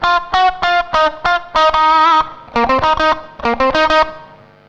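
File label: distortion guitar; USB Soundscan vol.31; Miro s Grooves; AKAI; DISTO GTR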